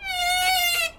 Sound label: door squeak